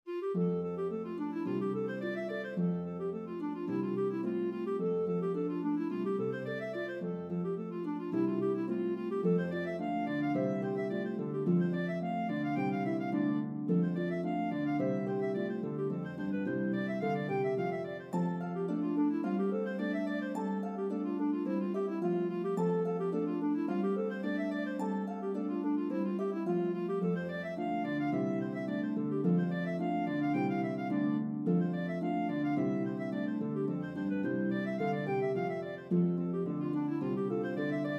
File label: Clarinet Harp, Duet for Bb Clarinet & Harp, reel; This reel is charming as a Wedding Recessional or for a Festive Event.